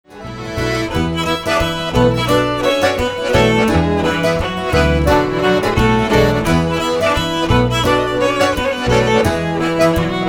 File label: scottish rural; Guerbigny